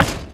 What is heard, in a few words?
cap close end; doomsday; Garry's Mod; tf2